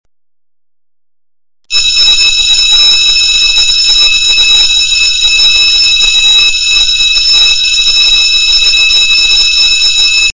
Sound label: ring a ding dong